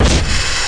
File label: HETZ GUN; PC Gamer IT CD 35